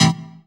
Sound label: Synths; Vengeance Samples